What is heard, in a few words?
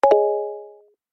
chime